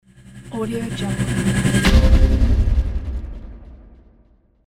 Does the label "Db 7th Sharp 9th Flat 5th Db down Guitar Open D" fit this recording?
No